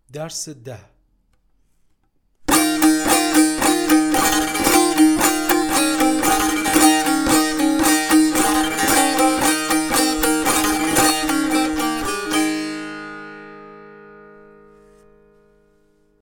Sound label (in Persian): مشق تنبور